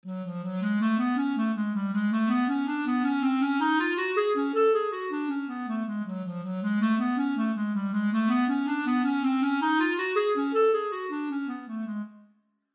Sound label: Clarinet; examples in treble clef